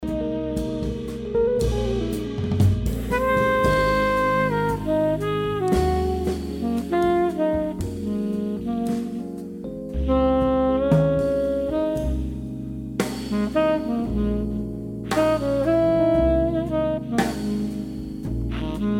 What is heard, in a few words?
solo